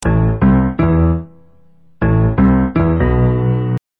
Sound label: Piano Plain Tone